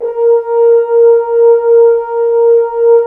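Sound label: French Horns mf, Dry; Roland L-CD702; F.Horns 2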